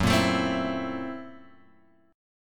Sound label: F sharp 7th Sharp 9th Flat 5th; F#7#9b5 Chord {2 1 X 2 1 0} strummed